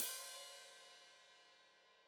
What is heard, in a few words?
Hat Foot Open, Hi-Hat; Deeply Sampled Drums